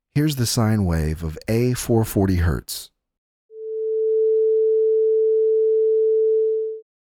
a440 hz sine wave

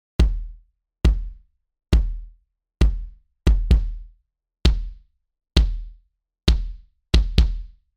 4 khz for that beater crack

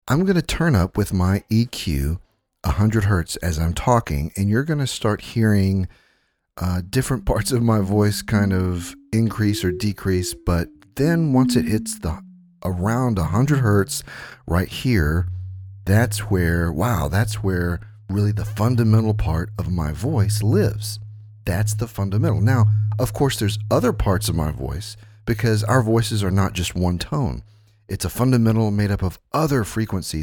100 hz as the fundamental in a male vocal

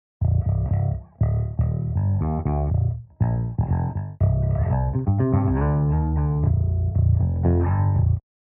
bass guitar with 700 800hz added

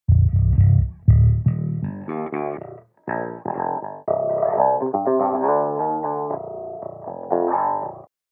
bass guitar with 700 800hz filtered

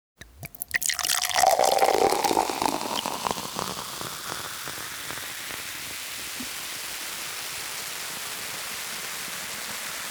10khz fizz

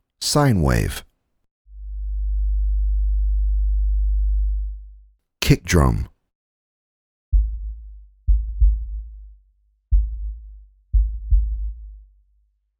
sine wave amp kick drum 50hz

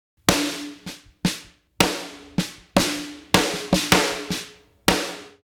snare drum without 200hz

